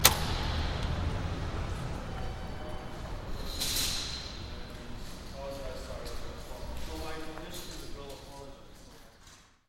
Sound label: office lobby